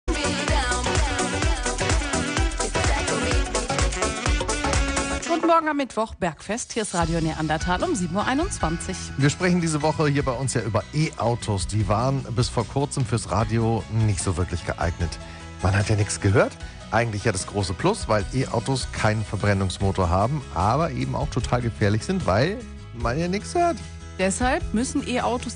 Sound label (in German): e mobilitaet geraeusche; e car sharing